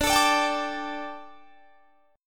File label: D Suspended 4th D; strummed; 22,24,24,24,22,22